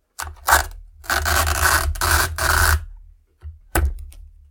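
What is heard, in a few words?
sticky tape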